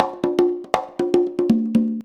CONGA 119, Modern Percussion Loops

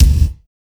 SPECIAL K, DNC KIKS; Drums In Your FACE!

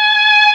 MELLOTRON; East West - Global Sounds

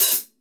MIX HH HIT, STUDIO STEREO HI HAT

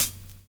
VINYL HH, Drums Dance & Groove; USB Soundscan vol.11; AKAI; VINYLKIT

